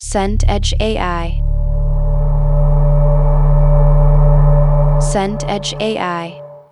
drone dark

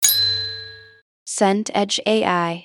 ding success

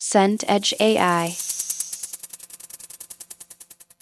texture granular wash

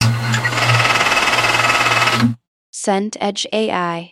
hard drive seek

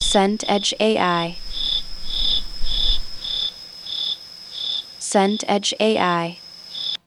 cicada bed

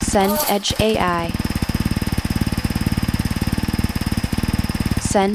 chainsaw idle

